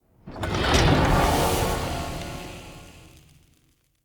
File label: Pump Station Barrier Fall; Encounters; Ode Pollution Tower